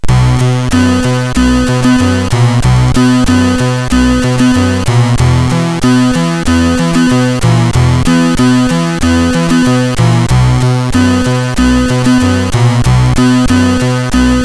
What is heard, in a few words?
Hip Hop, sid MOS6581R3; Mermaid; Early Tunes